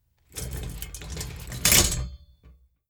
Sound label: Metal Cart Movement; Fairlight Sound Lib